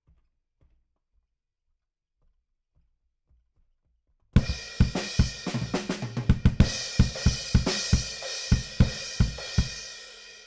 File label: BD Out